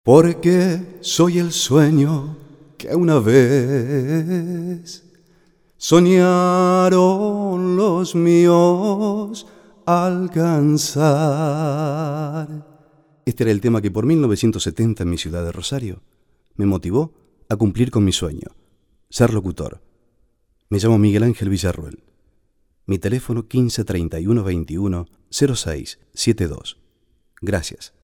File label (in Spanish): Presentación cantada